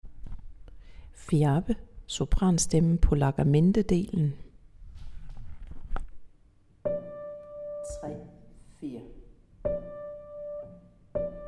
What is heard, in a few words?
Sopran largamente